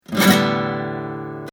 rasg original

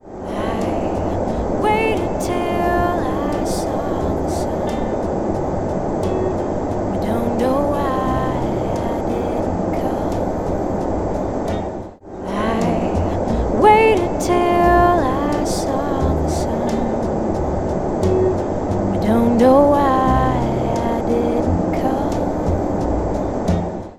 babble

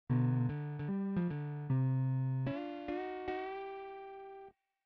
sine

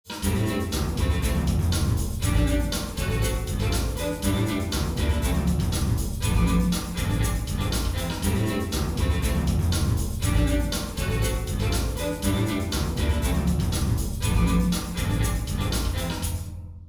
groove small reference

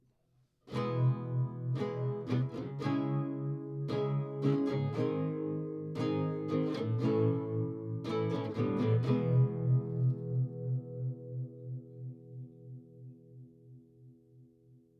live Guitar; microphone Recorder

